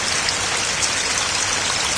water flowing, ambience

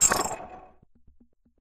editor other collapse, ui; Source Engine; Portal 2

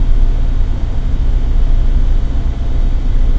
tone; tf; Source Engine; video games; ambient; lair

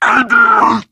charger lurk, voice idle; player; source engine; left 4 dead 2

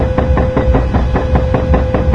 advisor enter loop, ambient citadel; source engine; episodic

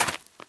gravel, footsteps run

